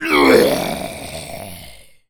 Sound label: pain, Zombies; zps; Z Cop